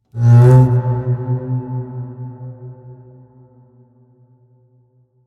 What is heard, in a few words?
Contrabass